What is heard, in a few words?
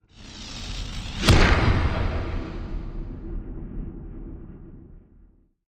Hard Echoey Impact Sweep